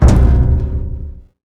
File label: impact barrel light